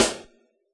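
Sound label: snare drum Elect., Yamaha DJX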